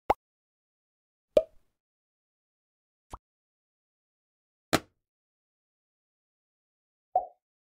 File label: Pop